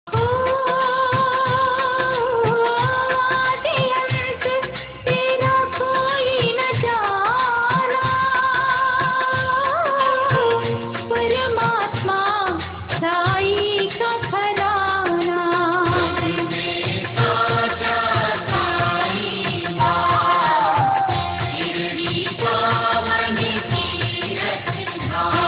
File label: Bhajan Song Ringtones; Devotional Ringtones